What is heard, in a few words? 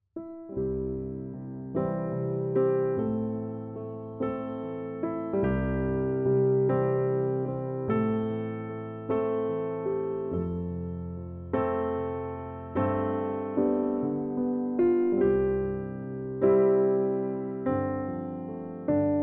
aleluya piano, MUESTRA DE PIANO SOLO; funerales